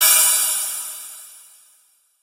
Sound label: crash